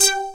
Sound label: BIG PAN BS; Pro-Rec - Synthsonic; BASSES